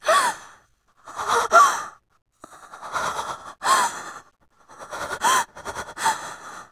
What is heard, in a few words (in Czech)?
Výkřik vyděšený